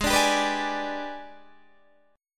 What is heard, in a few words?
Gsharp Minor Major 7th Flat 5th, Guitar, Open D; 18,17,17,17,17,18